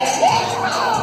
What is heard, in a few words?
Yeehaw